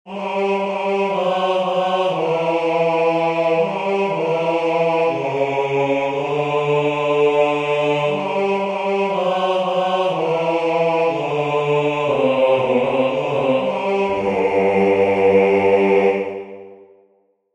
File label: Bass; UBI CARITAS